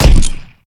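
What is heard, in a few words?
grenade launcher; FPS Game; ML-Agents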